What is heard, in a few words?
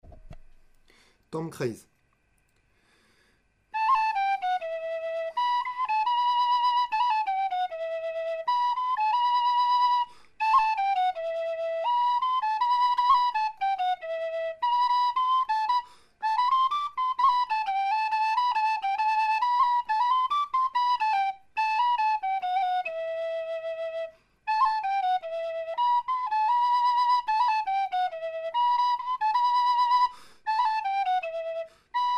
gavotte; Suite Montagne; Tamm kreizh